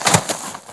see otter die

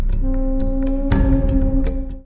cow

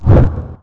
ash toad move

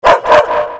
hell poodle attack

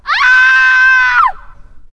banshee die

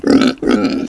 tatacho attack

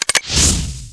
grenade fragment fire; night watch

